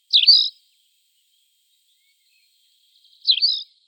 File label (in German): Brachpieper Anthus campestris; Vogelstimmen